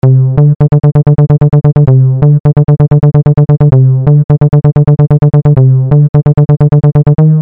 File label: Bass Loop F